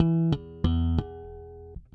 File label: Harmonic Riff, Bass; Construction Kit; Riffs, Patterns Licks; Bass X